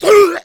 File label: hunter stunned; pain